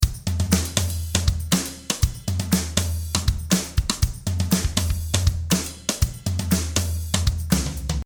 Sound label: Drum Dry